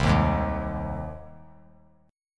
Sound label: C Minor Sharp 5th C 3C,3F,3A,3D; strummed; JGuitar